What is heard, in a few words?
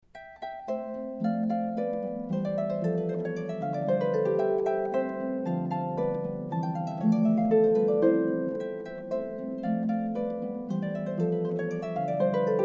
arpeggio